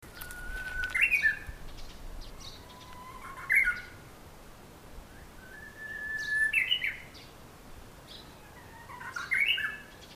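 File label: uguisu, Japanese bush warbler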